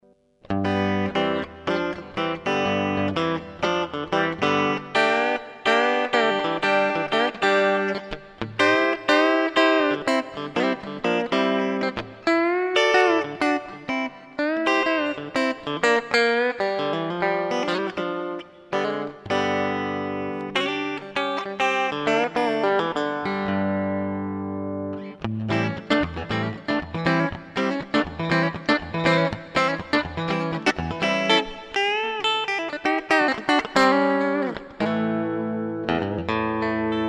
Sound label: telecaster; MCat; Mitte; Neck/Bridge 1; "MudCat set" mounted on a Fender Japan Tele